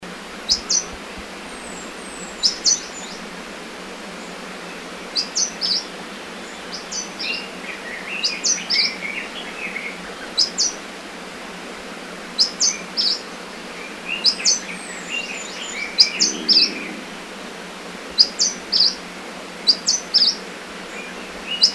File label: Claudia Leaf Warbler Call; Hunan Hu Ping Shan